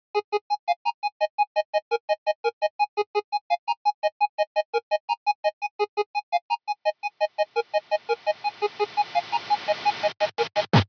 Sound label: 8bit